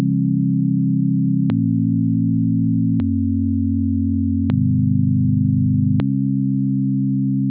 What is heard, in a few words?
chords